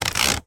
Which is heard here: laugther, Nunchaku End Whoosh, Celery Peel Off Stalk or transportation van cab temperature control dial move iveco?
transportation van cab temperature control dial move iveco